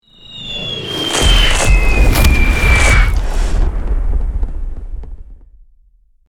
Aerial missile strikes bombardment explosions

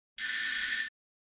Clockwork doorbell loud ring

